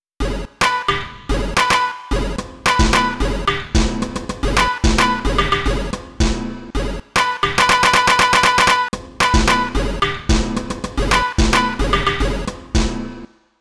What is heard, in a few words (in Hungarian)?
5 komponens additiv etud